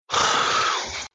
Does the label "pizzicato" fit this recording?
No